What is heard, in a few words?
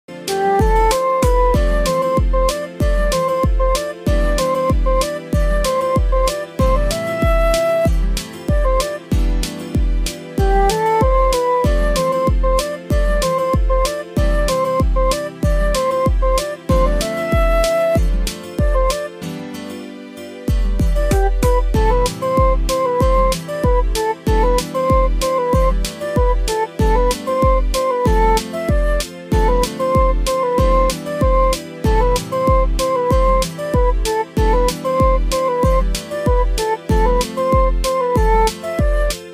song ringtone bgm instrumental bgm; Jawan